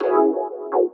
E stab; Loopmasters; Funky Underground Vol 2

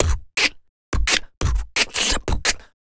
Human Beat Box, Drum & Bass; 170; Loopmasters

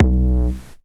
tom, One-Shot Drums

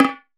Percussive

